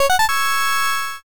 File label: join